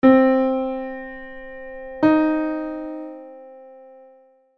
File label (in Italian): Terza minore